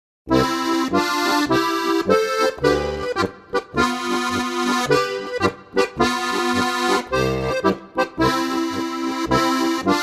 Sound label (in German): Polka